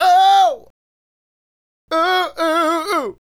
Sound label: Male Solo, Hip-Hop and RnB Vocals